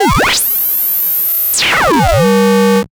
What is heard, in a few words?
Osc vs Ring Mod, vintage oscillator; radiosonics